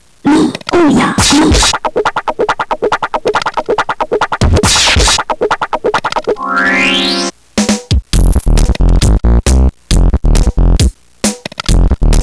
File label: Training Mode music; GAMECUBE; LUIGISMANSION